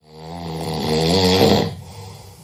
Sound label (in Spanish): ronquido